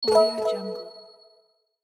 soft correct answer

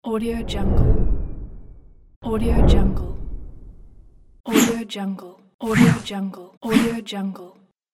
swooshing; 3dhome

